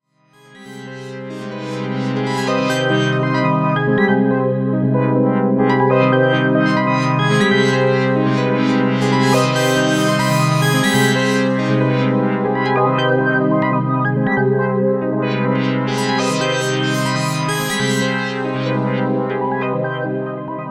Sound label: Dark Polyphonic Phrase